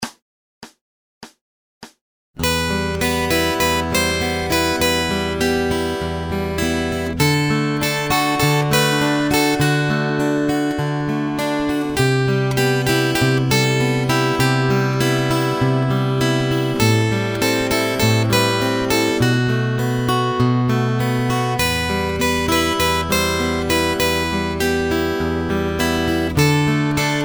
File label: Acoustic Accompaniment, acoustic guitar; Grade 1